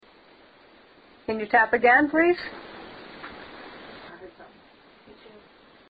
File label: Tap